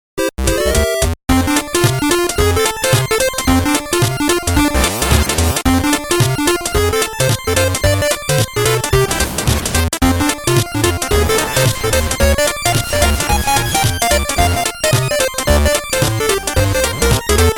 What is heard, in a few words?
video game retro